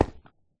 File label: stone, step; Minecraft